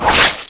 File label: knife hit; cstrike; JailBreak; Rambo